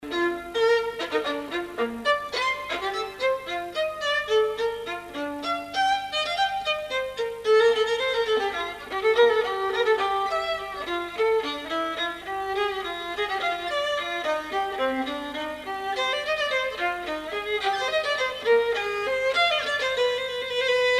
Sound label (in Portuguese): Viola